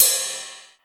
ride sound B key, ride sound sample tuned to the B note. Loudest frequency: 8686Hz